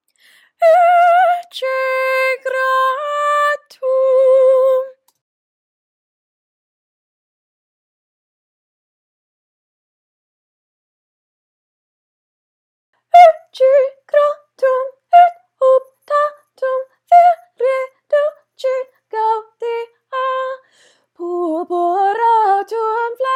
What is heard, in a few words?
ecce Gratum Womens Part Sung; latin